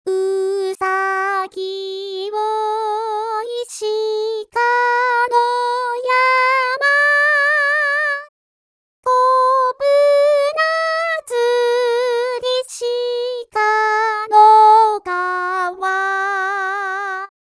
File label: vocal only